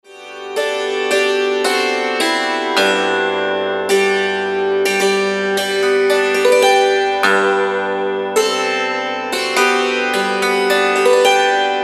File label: bridal chorus